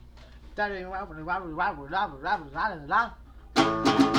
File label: acoustic